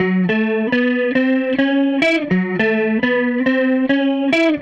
Gretsch Guitar Loop

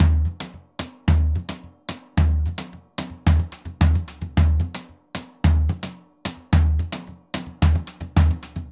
Surdo Murga 110 bpm; Best Service; Festa Latina VOL-1

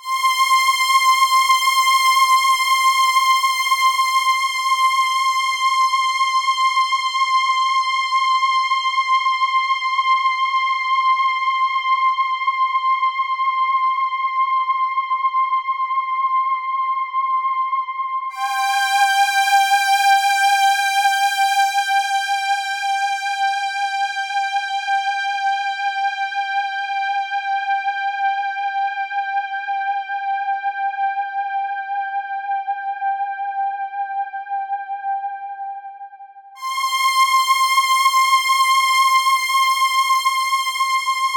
105 Cmaj SUGARFUNK STRING; 105 BPM

